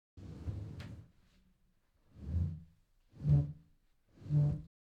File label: Moving Furniture